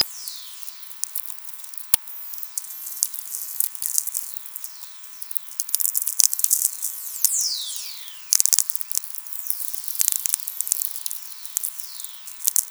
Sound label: vlf audio; SID